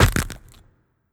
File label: Crab Pinch